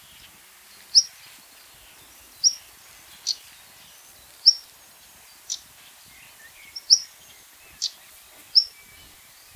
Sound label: stonechat call; Stonechat (Kląsawka); Very shy bird